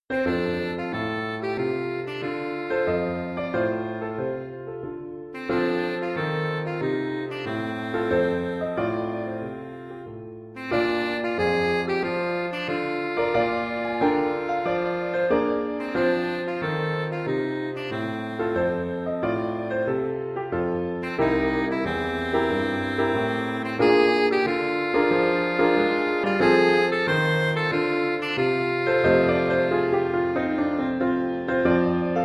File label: sax blues